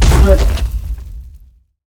War Damage; Greatblock

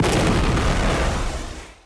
Poison; bullet

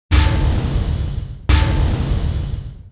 freezing

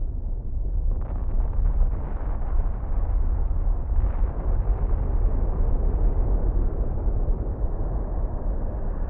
Volcano Mountains, ambience

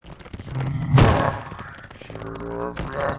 WAR IDLE

